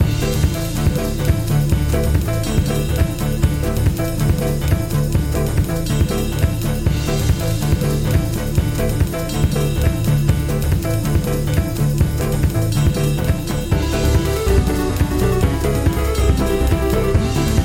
bgm summer battle

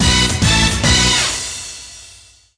Battle Win; Slotter Mania P Mach Go Go Go III; PSP gamerip 2011

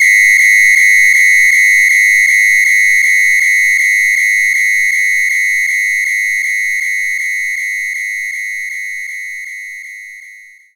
Whistle Long; knights try

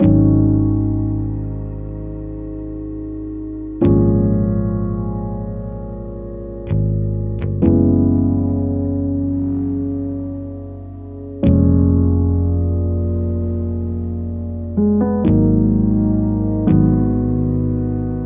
music chill loop; Necrobarista Final Pour

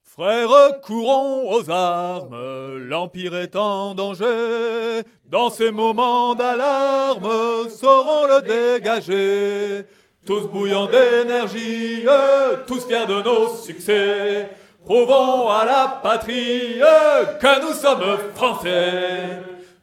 Choir; Assassin's Creed Unity

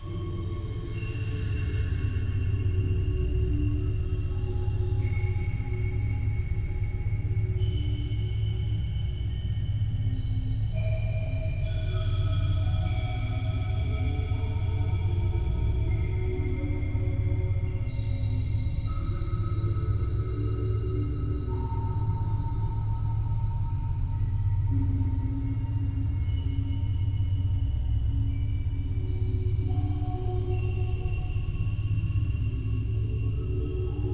Wormhole Amb

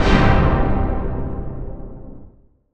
Chord

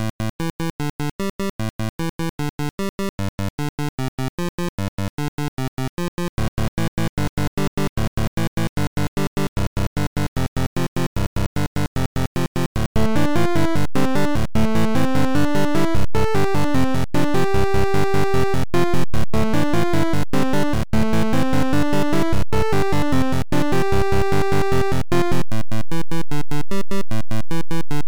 Dungeon Floor

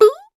vault vocal; Scrat

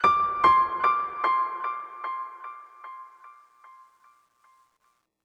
One shot Piano

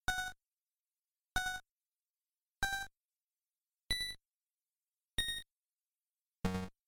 Weird Ping Tune; Teleroboxer; Virtual Boy

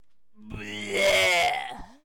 Vomiting(рвота)

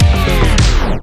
DJ Disco Stop; Plants vs. Zombies Battle for Neighborville

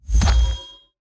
SFX Fight Appear Effect; mighty party 2017 gamerip pc ios android

